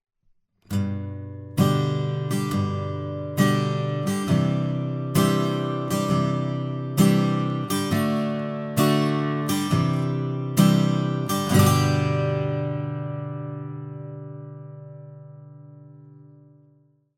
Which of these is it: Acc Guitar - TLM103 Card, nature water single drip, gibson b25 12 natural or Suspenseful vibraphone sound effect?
Acc Guitar - TLM103 Card